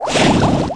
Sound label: torpedo